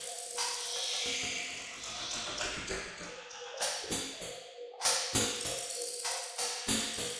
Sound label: Kabuki Metal, Rhythmic Atmospheres; Ambient Cinematic